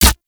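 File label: Infested Mech, bow; Cernos; Weapons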